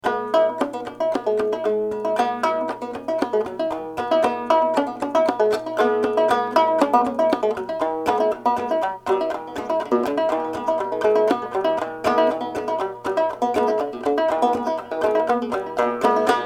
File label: banjo; cluck old hen